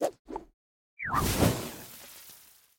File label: crash Hole